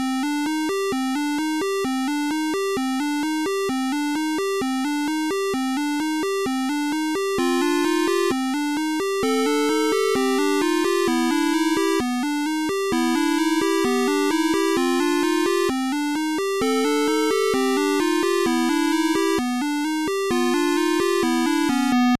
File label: 8-bit